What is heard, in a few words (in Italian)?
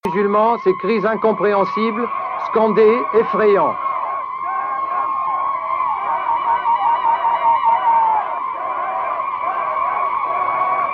grido donne; Gillo Pontecorvo; La battaglia di Algeri 1966